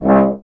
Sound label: Tuba; Miroslav Vitous - Solo Instruments VOL-2